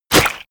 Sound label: hit blood heavy